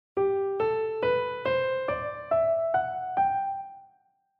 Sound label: G Ionian mode; key signature